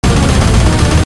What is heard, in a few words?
loop